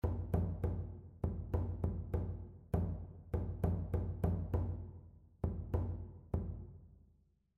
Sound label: rhythmic error detection; ear training